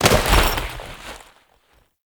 Foley Ledge Grab; Warframe; Kahl

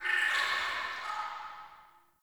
Under City Cavernous Water Lap Rock Distant

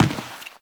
footstep; Step Default, Footsteps; Warframe; Teshin

